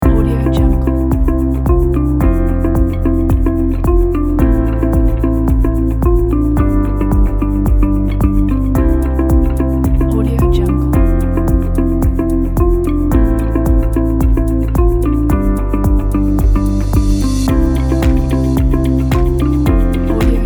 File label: uplifting corporate